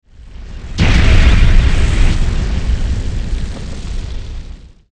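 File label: explosion fx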